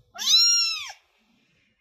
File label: meow